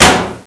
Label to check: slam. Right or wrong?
Right